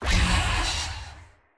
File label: alert whisperers in the void; Stellaris; shroud